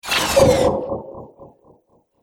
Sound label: Laser Rocky Rocket, sci-fi laser sound effect; Laser Future